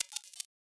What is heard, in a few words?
graphics glitchy motif; soundscrate